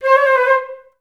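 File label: FL ORMI C4, Flute; Best Service; Peter Siedlaczek's Advanced Orchestra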